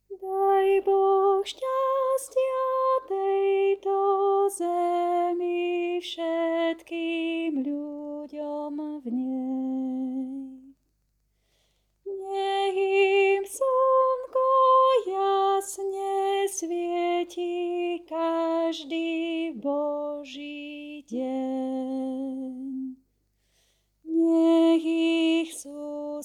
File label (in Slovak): Sopran; Daj Boh stastia